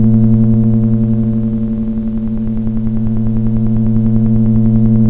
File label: MACHINE; shaunsville